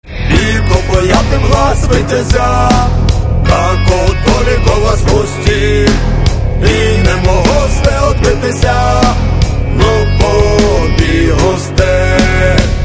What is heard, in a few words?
rock